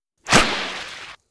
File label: Bullets Slice Water